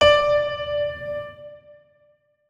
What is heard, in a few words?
notes, keyboard piano; Hideout Furniture